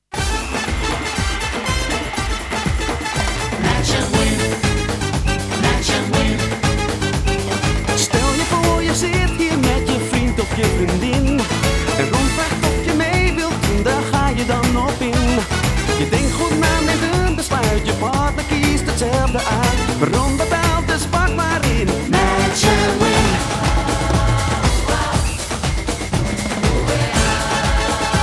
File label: Match Win Salsa Latin